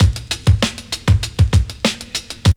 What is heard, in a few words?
break; Zero G - Man Machine